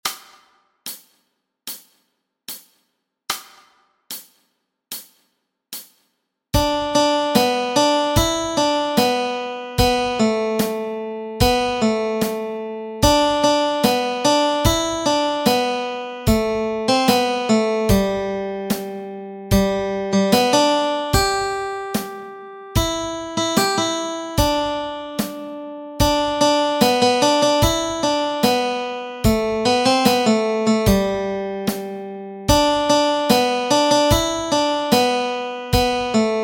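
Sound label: Melody Track, Easy Guitar and Ukulele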